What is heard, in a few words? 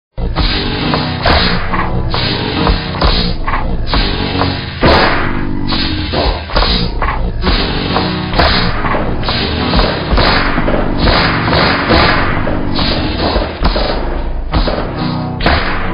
dongs; vapesquared